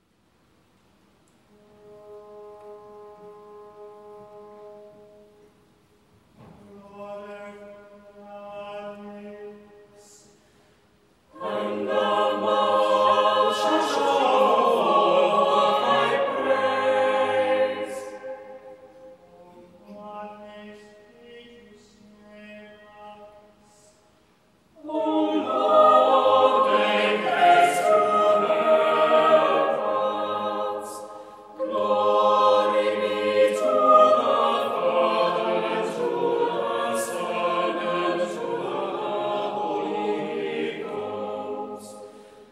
preces sung by the priory singers at truro cathedral; smith